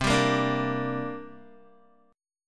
C 7th Suspended 2nd C down Guitar

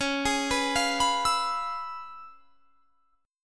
C sharp 7th Sharp 9th, chord; arpeggiated; C#7#9; JGuitar

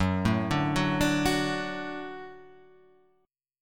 F sharp Minor Sharp 5th F sharp, Chord

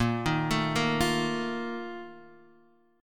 A sharp Major 7th Suspended 4th, Guitar, Open D

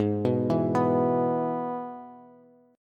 Ab Minor Sharp 5th, Bass; Abm#5 arpeggiated; JGuitar

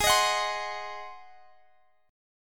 Ab 7th Ab down Guitar Half Step Down

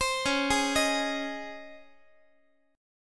C sharp Major 7th Suspended 2nd, Guitar; C#M7sus2 arpeggiated; x,x,15,11,12,14; JGuitar

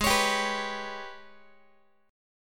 G sharp Minor Major 7th Double Flat 5th, Guitar; G#mM7bb5 strummed; x,16,16,17,15,x

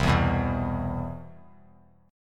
C Major 7th, chord; CM7 strummed; JGuitar

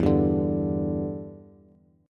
E Minor 7th Bass; x,7,5,7; strummed; JGuitar

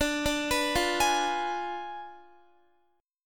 D Diminished 7th D down Guitar; Ddim7 arpeggiated

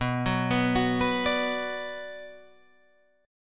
B Minor B Guitar Open G, chord

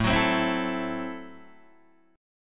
A Minor 6th A Guitar Open D

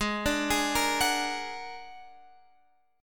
A sharp Augmented 7th G sharp

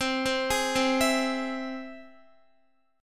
C Augmented, Guitar, chord; arpeggiated; x,15,12,13,12,12

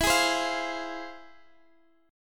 F Minor E; strummed

